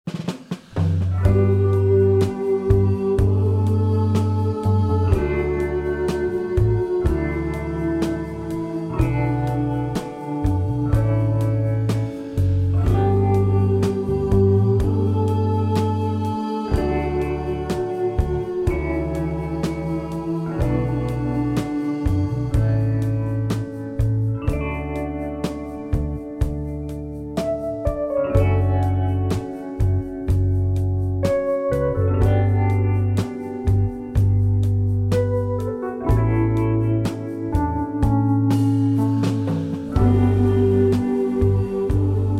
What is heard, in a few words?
Soundtrack Snippet